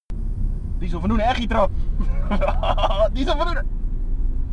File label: DIESEL